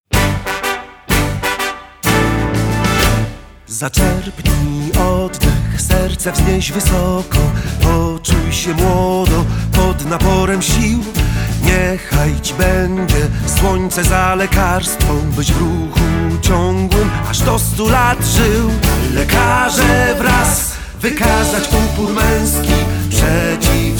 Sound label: Hymn